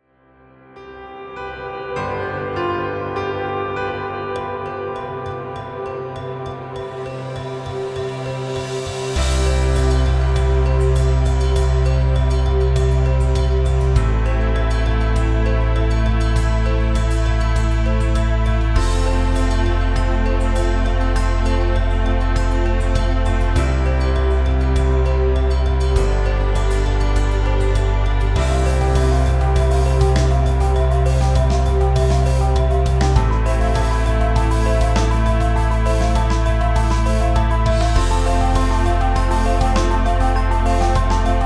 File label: Modern Traditional Fusion Music